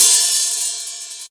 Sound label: hh open, Yamaha TG-500